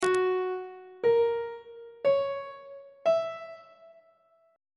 c sharp dorian 7th chord 4 is f sharp dominant 7th; treble clef